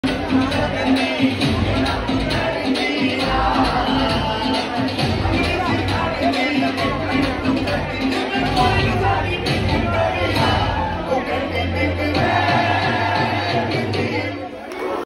club music; dance pub club

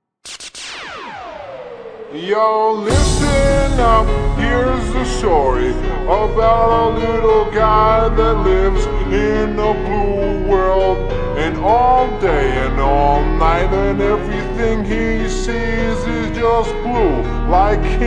very sad slowed reverb

